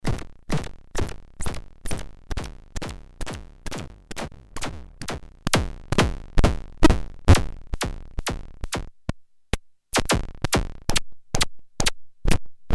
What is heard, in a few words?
Landscape Noon Plast